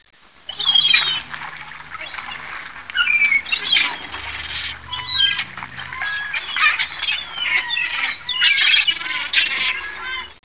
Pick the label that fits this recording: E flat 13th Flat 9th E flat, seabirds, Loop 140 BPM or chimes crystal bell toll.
seabirds